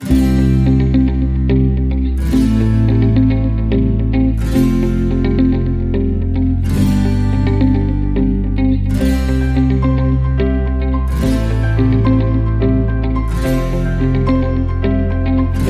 Acoustic Inspiration No Drums; 30sec

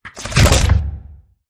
Zombie Bite

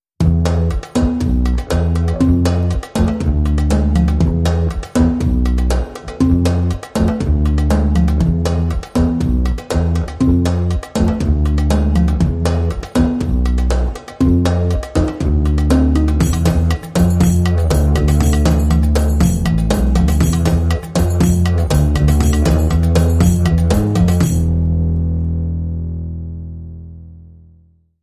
Middle Eastern Soundscape Underscore; 30sec

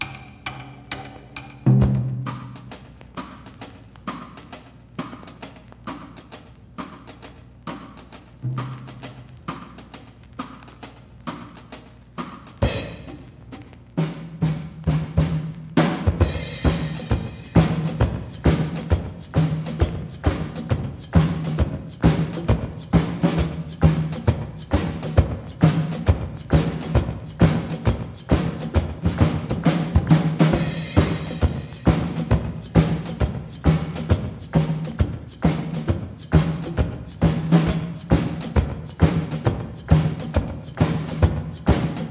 Drums

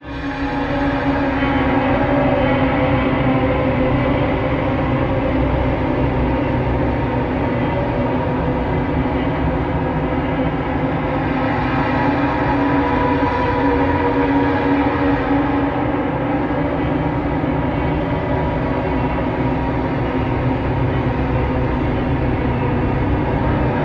Eerie Atmo

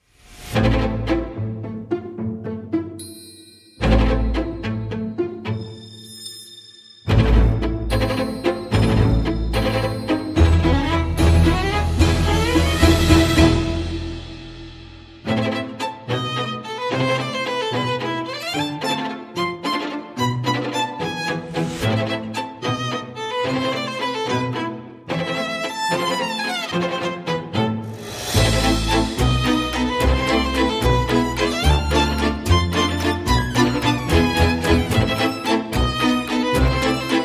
Light Percussion

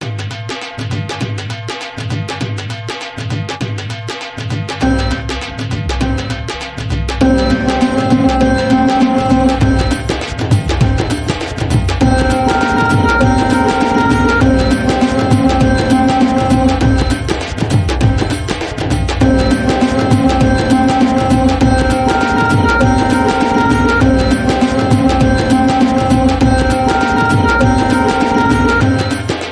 Raga, Beat

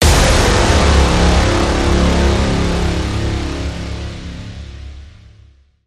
Air Raid

